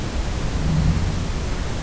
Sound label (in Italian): campione amplificato del possibile mugugno